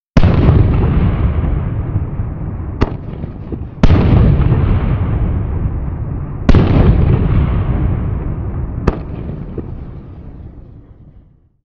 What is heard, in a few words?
Ambience; 1916 der unbekannte krieg gamerip